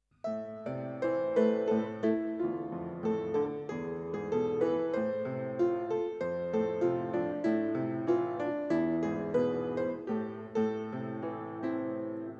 дети пение; Верю, Боже, Ты со мной; г. Саки; Записи Богослужений

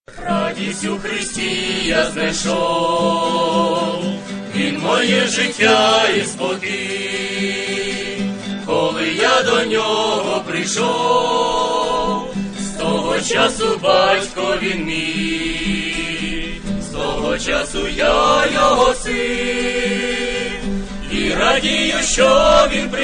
Пение; Радисть у Христи

